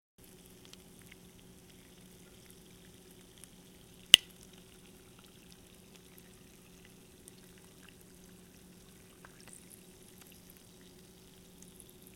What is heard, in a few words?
Fizzing Soda